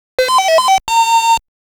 Extend Sound; super pac man

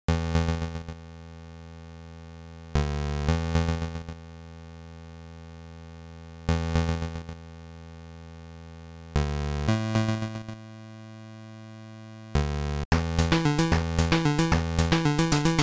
Chiptune; Small Saga; Chiptune Spelunking

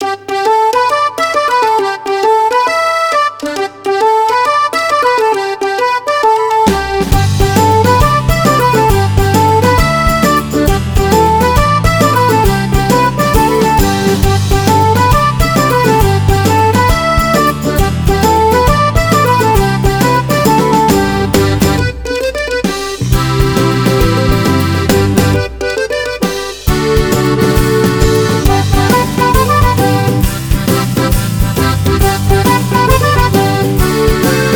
Pirate Song; Meow Motors

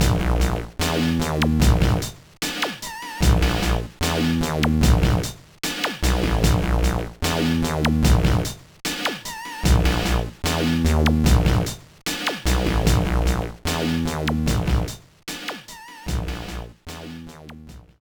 BGM; Guru Logic Champ; GBA

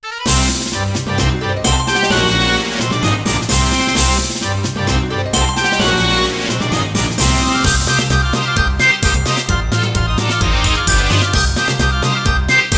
Stage 1 Boss BGM Short Ver.; Trouble Witches Origin; Daughters of Amalgam

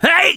Drums; the princess and the frog; Twitch Drums

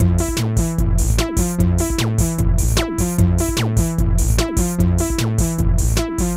Temple Dojo D - Electro

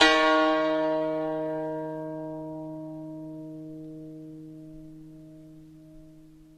koto; Summer Catchers

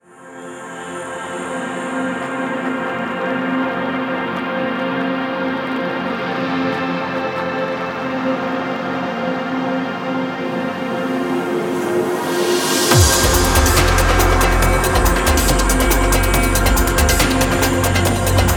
menu music; Starblood Arena

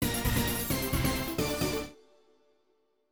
Jingle Positive; nekocharm

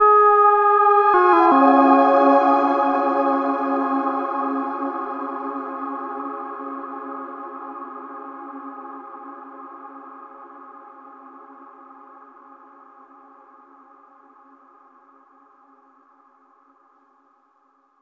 Tone

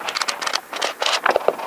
Sfx Page Through

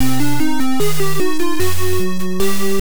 Boss BGM, Intro only; Pocket Zaurus Ju Ouken no Nazo; Family Computer

